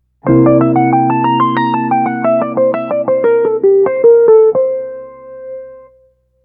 Dominant Lyd. Dom

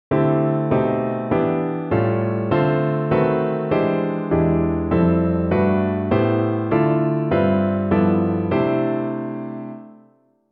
Harmony modern

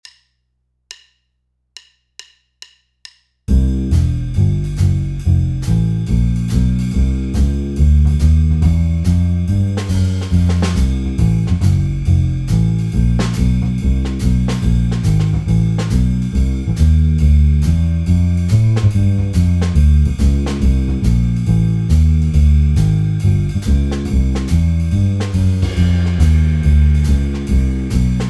blues in c minus

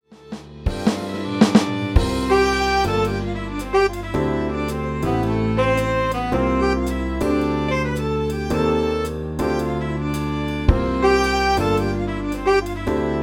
Harmony retrogression

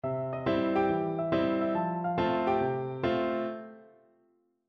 Rag Swings

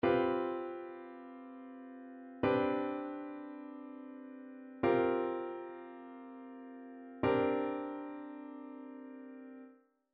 ma7sus7sus

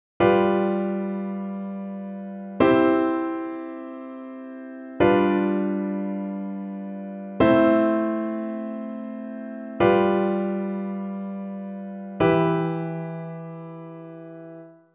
Harmony V7 I V7 VIm V7 IIIm

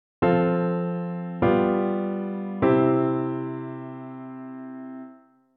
Harmony progression

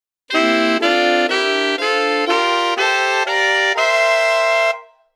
Block drop 2 Saxes

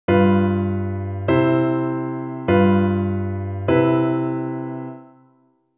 Harmony V7 I V7 Ima7

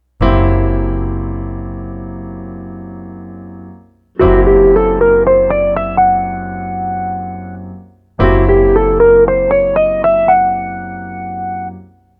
Triton Modes

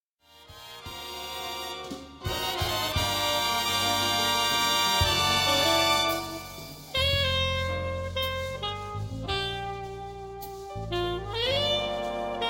Jazz Ensemble; Howard University

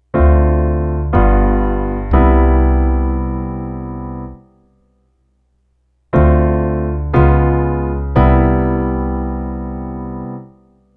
Triton 2 5 1

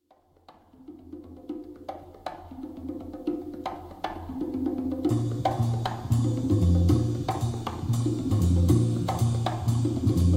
Samba Siete

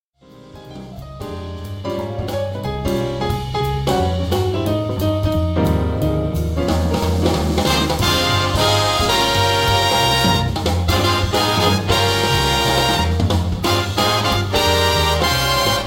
Jazz Ensemble; Howard University

